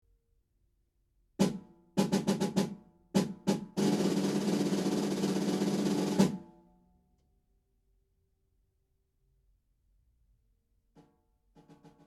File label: calf white